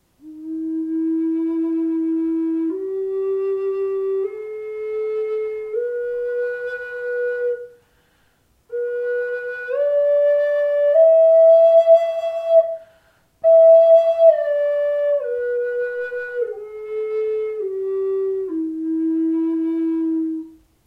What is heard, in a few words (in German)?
Bambus E 432 HZ